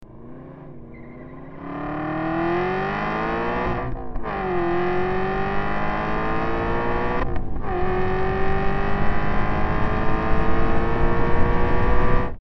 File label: V8 Vantage